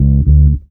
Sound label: BEL; USB Soundscan vol.32; Roots Disco; AKAI